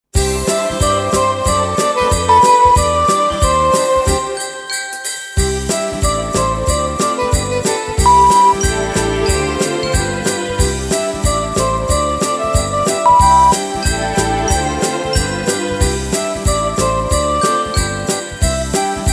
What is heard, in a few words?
XMas Swing B